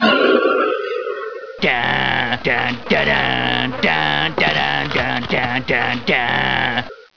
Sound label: wizard death; butthead